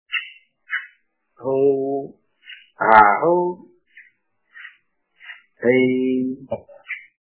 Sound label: Gir Cow sitting at night